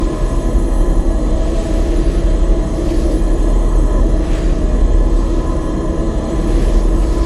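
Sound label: nexus powerup heart full loop; LoL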